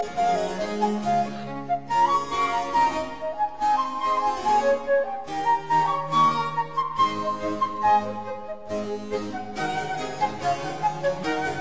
Quatuors Quartet Allegro; No 5 in G major; TWV 43G5

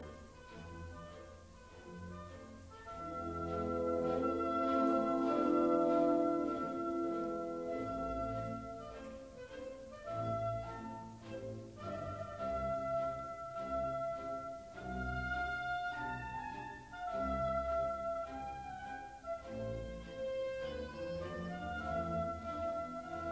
Symphony No 9 in C major Andante con moto; Schubert

